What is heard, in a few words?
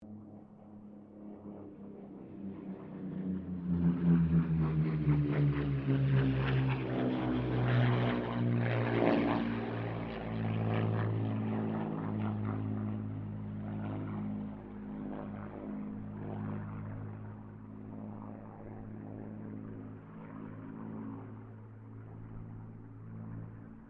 AIRCRAFT SPITFIRE MUSTANG KITTYHAWK PASS DISTANT; Mixed aircraft squadrons; Airplanes (Sound Effects:Vehicles)